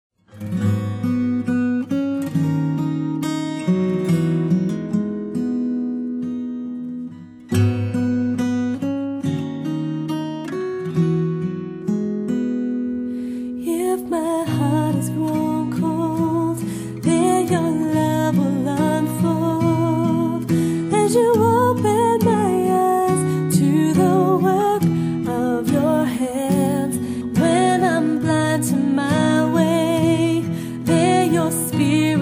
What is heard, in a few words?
Acoustic